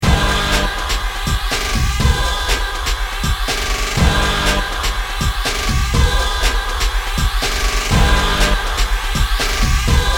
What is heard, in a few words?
Down Beats